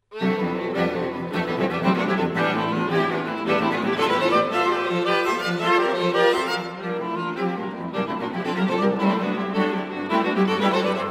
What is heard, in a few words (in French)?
Quatuor a cordes; Choveaux